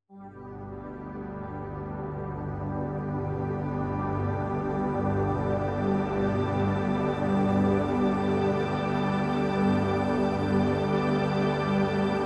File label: workstation pad multi; kurzweil k2700